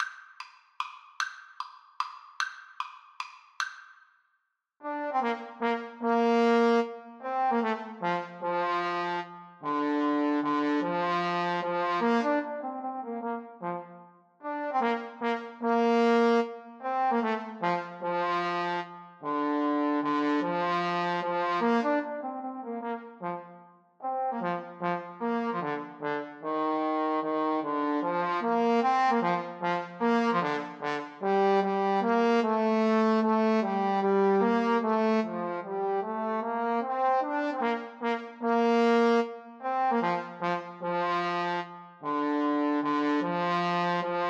Trombone Duet

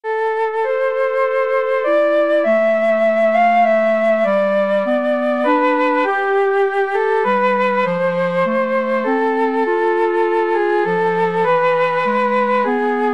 flute clarinet duet

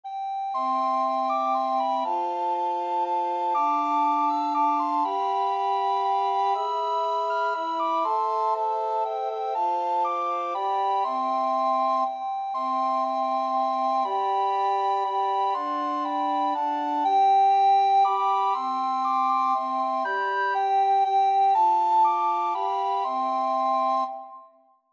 recorder quartet

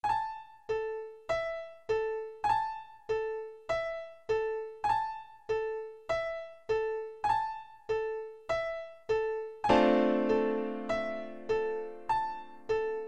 time for jazz, piano

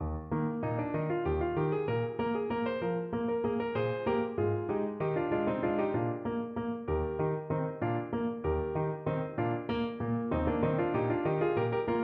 zwiefacher, piano; eisenkeilnest

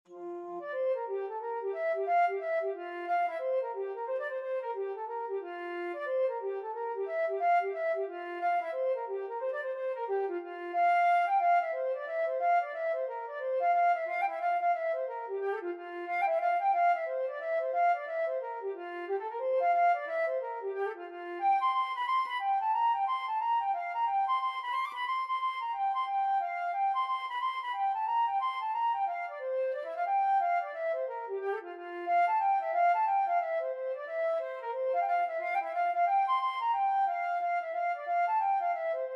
jennys welcome, flute

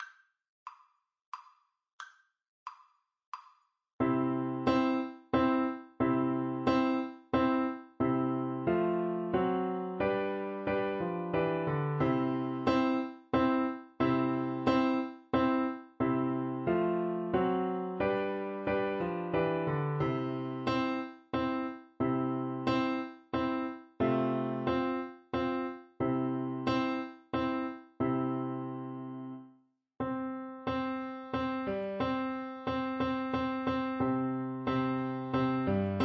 autumn, Flute

